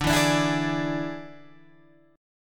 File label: D Suspended 2nd Flat 5th D Guitar Open G